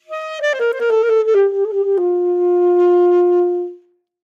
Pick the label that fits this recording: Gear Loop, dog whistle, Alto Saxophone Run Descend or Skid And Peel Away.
Alto Saxophone Run Descend